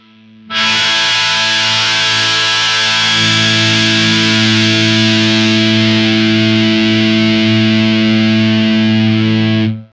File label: a single powerful heavy m, rock